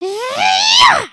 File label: air guitar; emotes